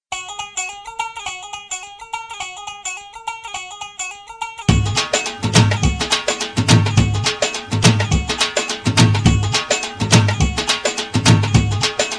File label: Punjabi Tumbi